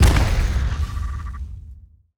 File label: cuckoo clock cannon fire